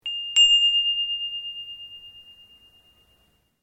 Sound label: Bell Handbell Priest Japanese Ring Double Soft, Japanese priest bell; Bell Sound Effects; Bells (Sound Effects:Musical)